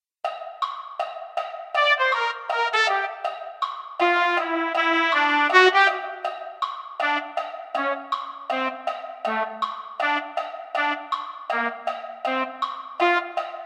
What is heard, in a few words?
trumpet 2; holiday brass